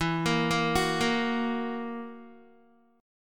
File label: E Suspended 2nd Flat 5th E down Guitar; Esus2b5; arpeggiated